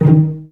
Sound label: CL PIZZ D2; Best Service; Peter Siedlaczek's Advanced Orchestra